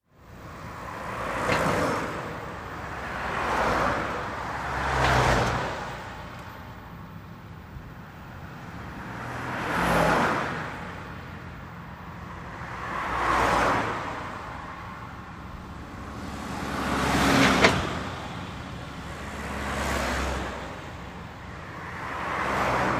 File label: traffic